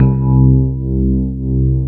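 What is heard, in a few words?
C4 electric piano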